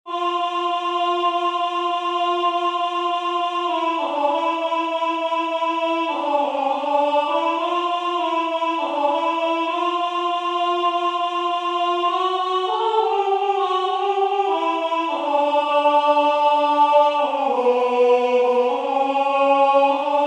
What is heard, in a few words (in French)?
Alto